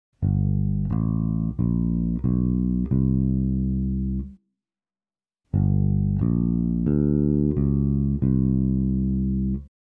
Chromatic approach, bass; Bass Unlimited

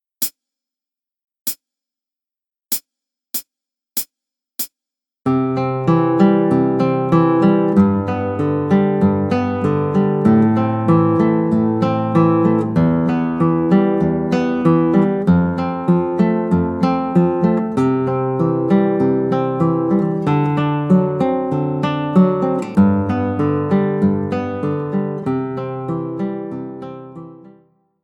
Begleitung, Gitarre; Play Guitar Gitarrenschule 2

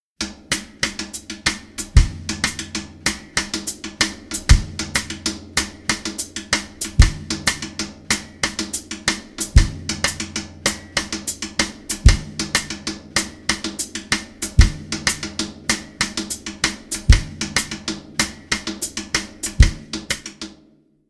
Cascara Groove; Cascara-Groove 3; Schwarzes Album; Bum Bum Tschack Jazz Latin

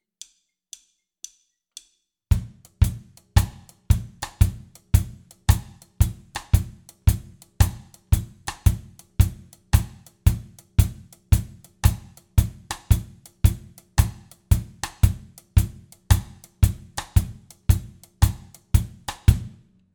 Reggae, Shuffled Steppers; Bum Bum Tschack 1

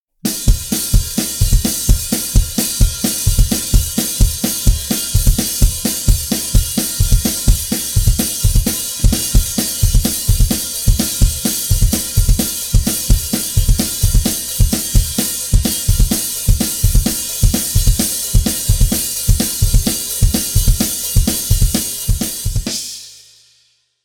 Punkrock-Beats 2; Schwarzes Album; Bum Bum Tschack Grooves Fills

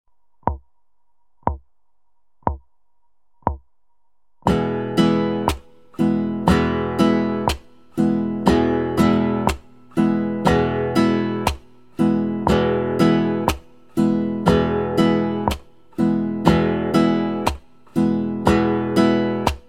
Strumming, Acoustic Pop Guitar; Strumming Nr. 7

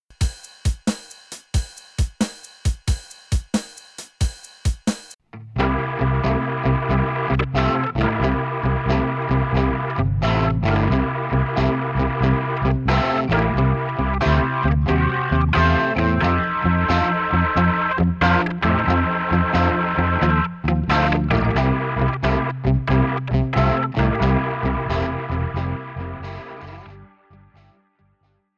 Rockin Shuffle, Grooves; Bum Bum Tschack Grooves Fills

